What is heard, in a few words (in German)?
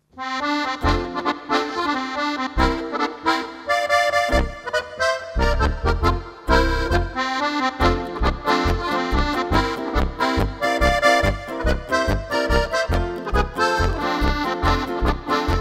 Polka